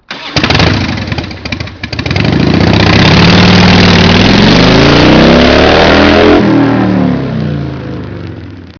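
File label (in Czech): softail, chopper a cruiser; vs1400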